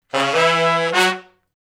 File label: Shuffle Blues Riff (F#) low unison